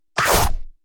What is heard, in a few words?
monster